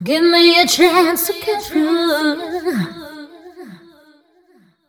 f mel f, fem Vocals

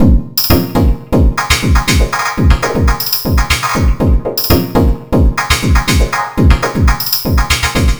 Vocoder Beat, Drum Loop; Sonic Foundry; The Electronic Music Manuscript Collection; Drum Loop Structures

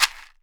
AXATSE SH, AFRCN SHAKER; Voice Crystal - Steve Reid Definitive Percussion

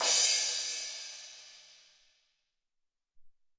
16 Crash, Alesis SR-18

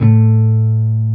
Jazz Guitar

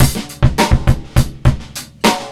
beat, Roots Funk; USB Soundscan vol.41; AKAI

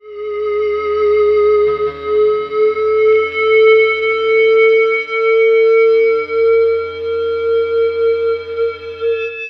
Guitars; Total Drum&Bass; 90 s Sample CDs

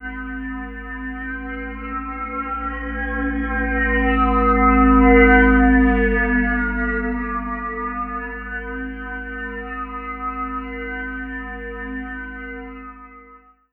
WITCHCRAFT, Anime Sound FX; FLYOBJECTS

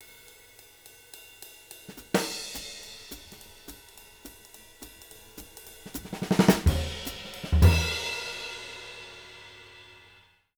212 SWING, Living Drums; Sampleheads; Peter Erskine; JZ212

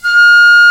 Piccolo mf F4; Digital Sound Factory Virtuoso

